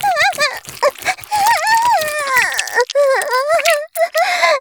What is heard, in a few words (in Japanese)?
絶頂 中出し; 騎乗位